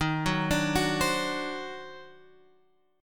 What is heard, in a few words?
D sharp Minor Major 13th D sharp Guitar Open G